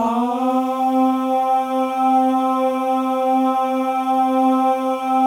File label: MALE AHS; Spectrasonics; Symphony of Voices